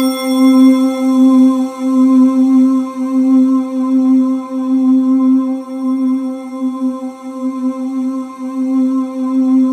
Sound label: C4, Synth Leads